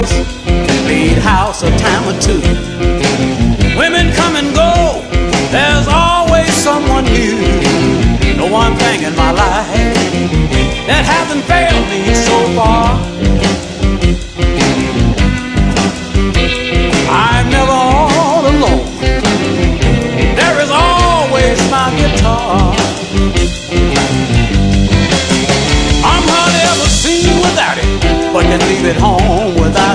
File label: my guitar